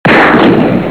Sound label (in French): Tir fusil; bruitage; Armes